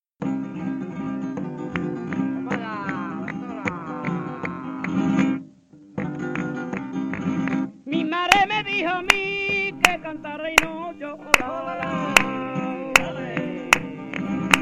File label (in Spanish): Sevillanas